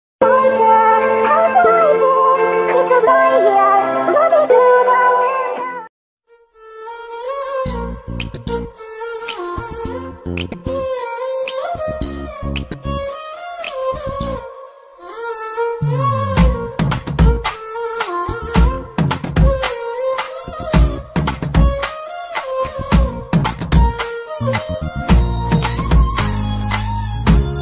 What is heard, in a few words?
violin romantic ringtone